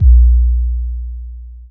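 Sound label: bass drum boom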